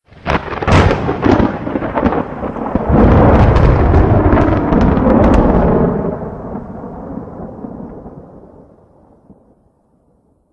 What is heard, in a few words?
storm effect; zombie plague